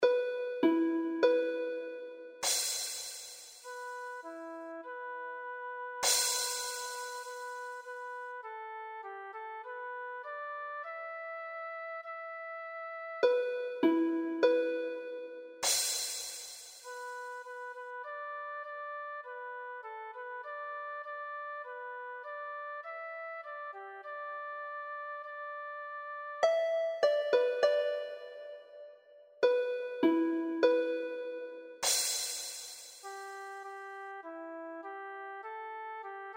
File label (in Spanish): Canto micaélico Liras flauta o voz